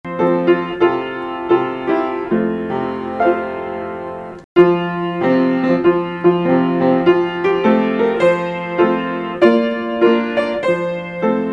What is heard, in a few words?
One Door, piano; One Door and only one and yet its sides are two, inside and outside, on which side ar